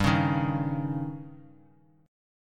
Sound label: C sharp Suspended 2nd Flat 5th G